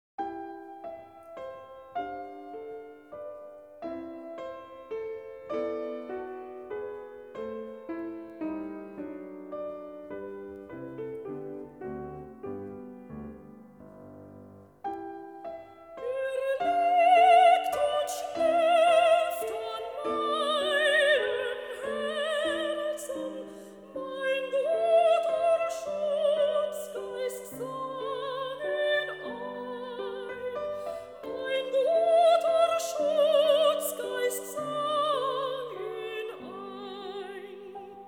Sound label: song for voice & piano; Margaret Price; Schubert; Sawallisch; An die Nachtigall (Er liegt und schläft); D. 497